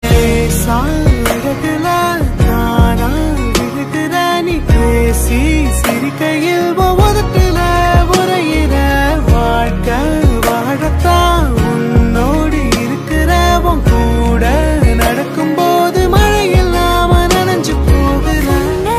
LOVE SONGS TAMIL RINGTONE

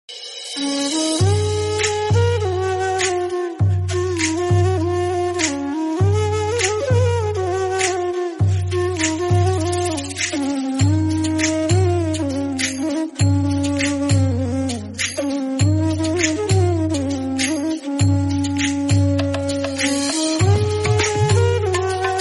FLUTE RINGTONE; KRISHNA

